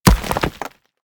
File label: hit; smite; geb